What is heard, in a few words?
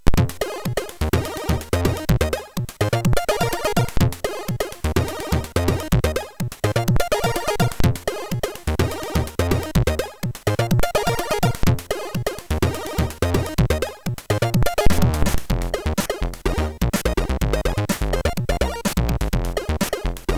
Jazzers, MOS6581R3